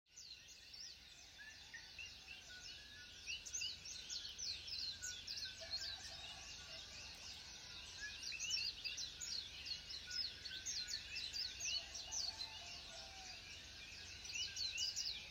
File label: nature birds ambiance morning